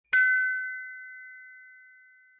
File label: important item aquired